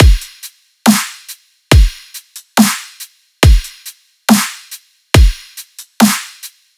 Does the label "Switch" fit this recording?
No